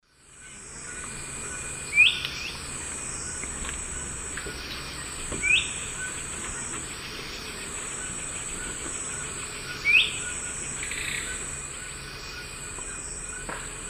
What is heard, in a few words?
Euphonia laniirostris, calls female near nest; S. America; Eastern Lowlands